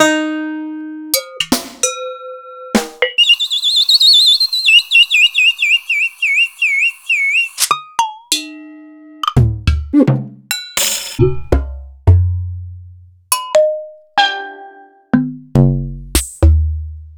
EMU Planet Earth

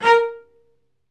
A#3, Spiccato Celli; StSpiccato Celli

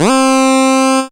syn c, Dance Synths, Acid Bubble; ProSamples vol.37; Best Service

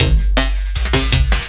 LOOP, Drum & Bass, 170 BPM

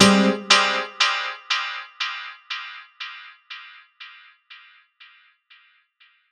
House Piano Stab; Big Fish Audio; OSH Chords

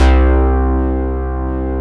FACE BASS C3, TRACE BASS, Synth

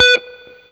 BLU POP, Guitar & Bass; 90 s Sample CDs; Quantum Leap - Guitar & Bass

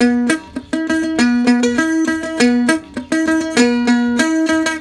World Lick

